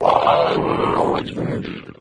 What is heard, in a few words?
leap, flood